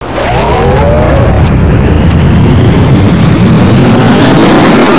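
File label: grav grenade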